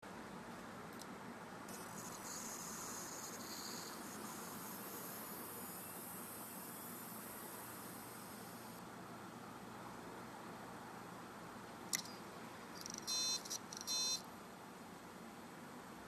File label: barracuda lp heads, damaged hdd sounds